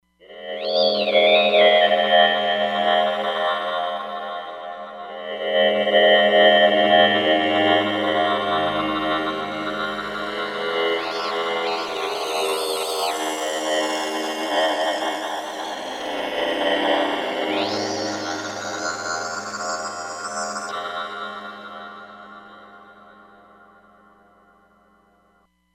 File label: Alien Voice, Roland Fantom XR